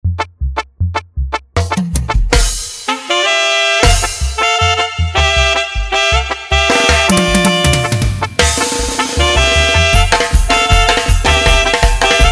Duranguense Extended 158 BPM

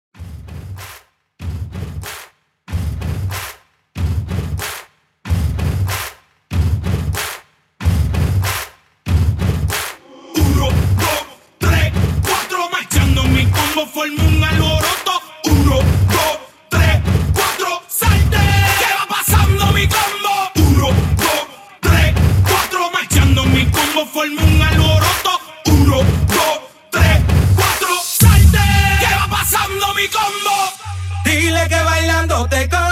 Intro 94bpm

